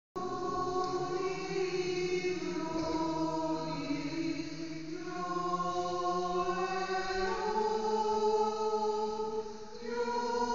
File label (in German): Adventjodler